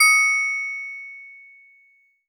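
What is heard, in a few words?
Steel Drums DX100 C#6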